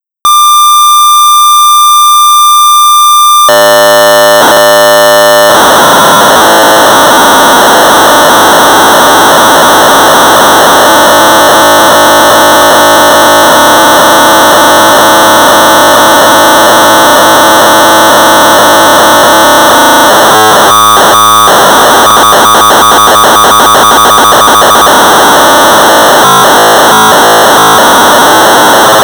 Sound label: Sorcerer 1200 baud; Home Run; J.T.Staley; Wollongon Sorcerer Users Group; BASIC